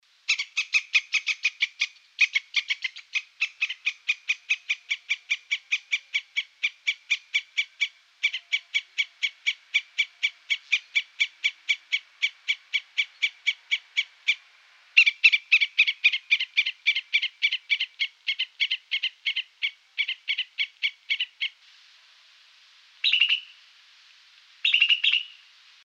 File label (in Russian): belka; звуки белок